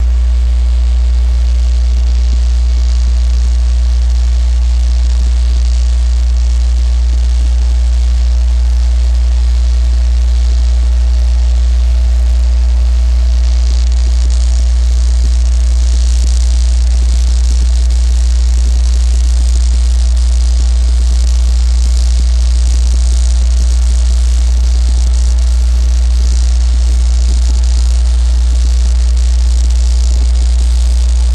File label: Dam Power, buzz & hums; Guri Dam Power Distr